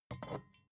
bottle vodka pick up grab, gastronomie

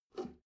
drain valve close soft, Badezimmer; haus garten

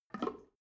drain valve open soft, Badezimmer; haus garten

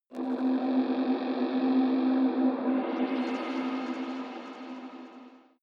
shot universal glance, sweeps und swells; comedy-cartoon; soundpool

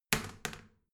toilet seat wall open regular, badezimmer

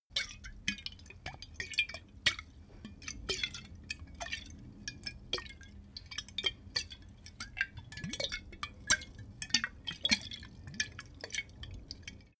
bottle vodka movement, gastronomie

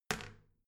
toilet seat wall open soft, badezimmer

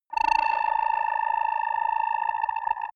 rusty drone, raumschiff

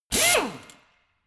impactor medium, bohrer; maschinen

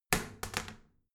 toilet seat lid wall open heavy, badezimmer

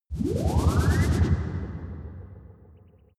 lightspeed jump preparation signal, raumschiff

